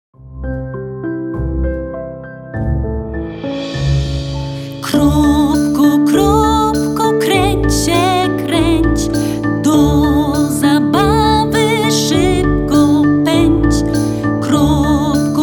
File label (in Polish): kropka wersja z wokalem